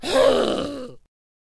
Death Vocals; Void Cultist

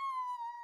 Valkyrie Death Vocals

